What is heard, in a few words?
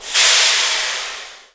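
Emergency Brake, Train; OpenBVE; CC-72085 bleu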